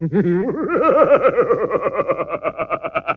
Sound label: laugh